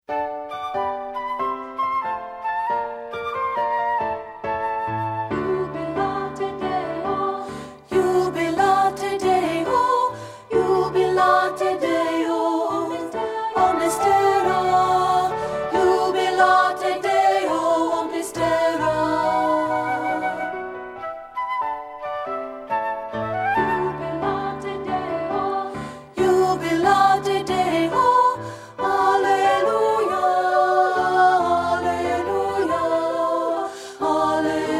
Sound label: alto; twopart